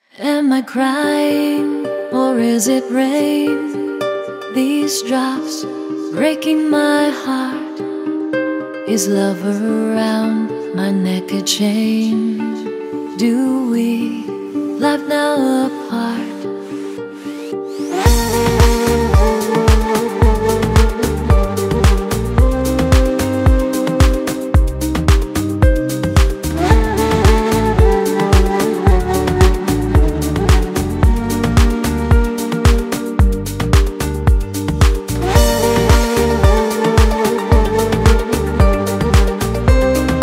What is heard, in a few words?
Rainfall